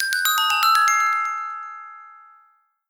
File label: Puzzle Solved Jingle